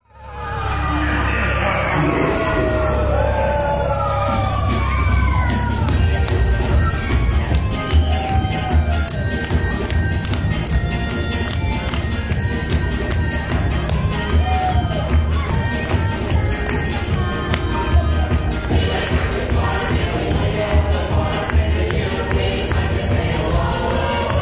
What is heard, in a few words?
Haunted Kids Ride, Haunted ride with kids shouting, electronic voices, and loud energetic kids; Haunted ride with kids shouting, electronic voices, and loud energetic kids music; Rides